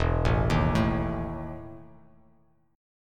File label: E Major 7th Suspended 4th E; EM7sus4 arpeggiated